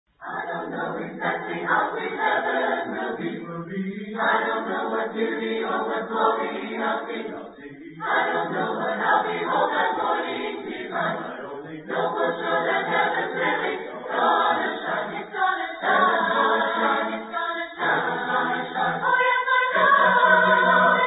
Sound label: a capella hymn; heavens gonna shine